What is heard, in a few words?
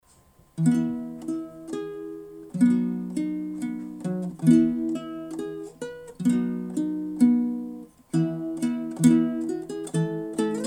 Bandurka Uke; Oj pid vyshneju pid chereshneju; Korala